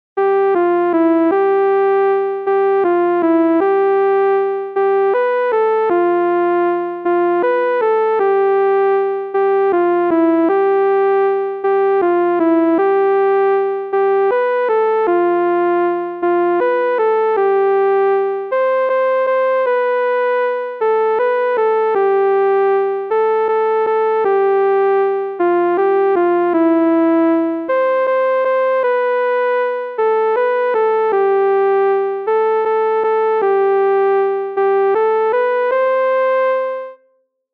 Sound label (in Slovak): valcik; ciferskej besedy